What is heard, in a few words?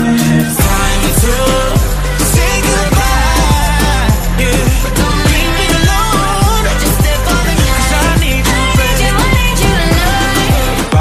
hot electro ringtone